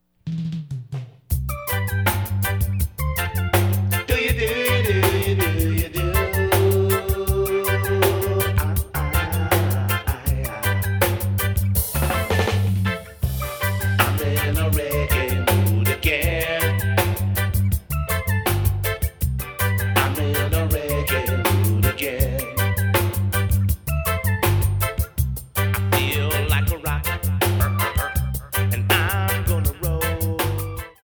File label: Reggae Mood